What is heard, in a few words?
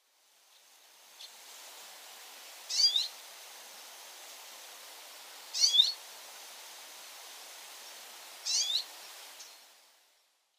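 benihiwa, Common Redpoll; koe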